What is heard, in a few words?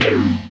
FX SPACE, SPACEFUNK; Sound & Vision; Gigapack II CD 2 (Roland)